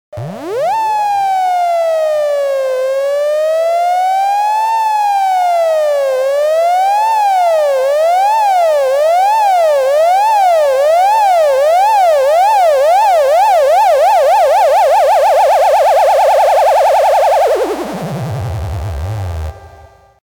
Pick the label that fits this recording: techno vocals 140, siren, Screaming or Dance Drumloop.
siren